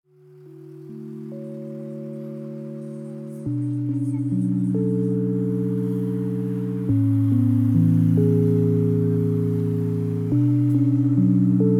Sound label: lofi hip hop